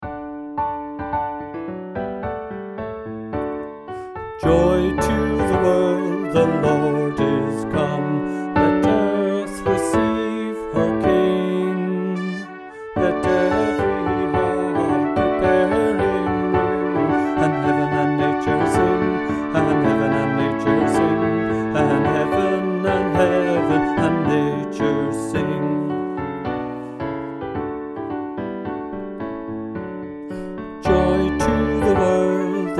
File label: Alto; Joy To The World